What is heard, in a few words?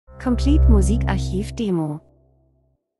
transition; Transition & Movement - aufmerksam tief schwebend